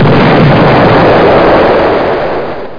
bomb

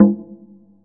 LOW CONGA; Real Oldies2

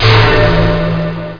POWER

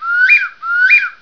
bird; WinDates

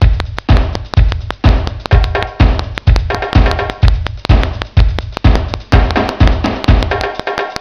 Drums; Drums & Stuff

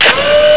machine

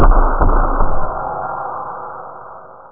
sea

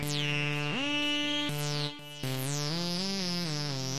solo

